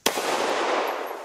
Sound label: g17 fire, Guns